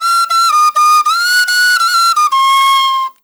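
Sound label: PENNY WHISTLE; Spectrasonics; Heart of Africa 2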